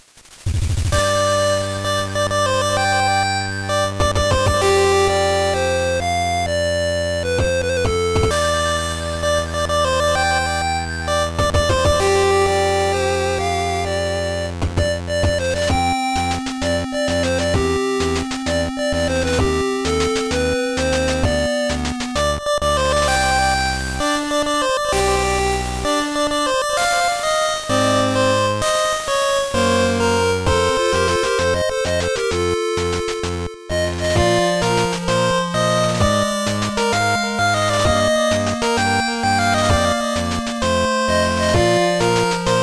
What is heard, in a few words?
8Bit; PMD2